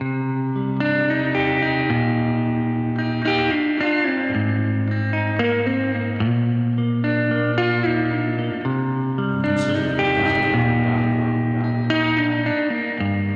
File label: Gold Guitars Sample Pack; WavSupply; FrankieOnTheGuitar